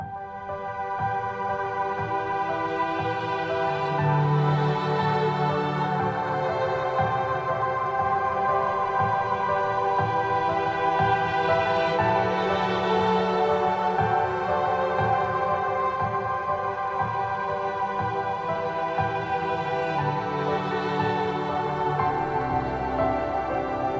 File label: Family Ambient